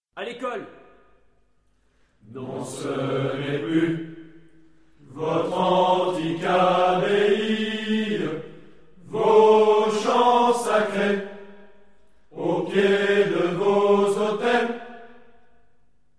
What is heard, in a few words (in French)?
Hymne; ecole